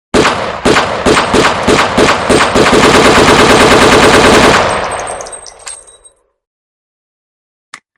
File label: ak47 loud